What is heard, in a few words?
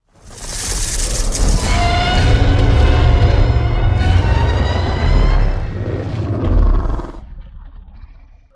kaiju roar; monster zero